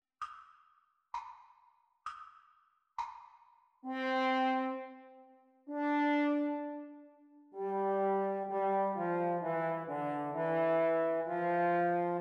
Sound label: French Horn Duets Playalong Practice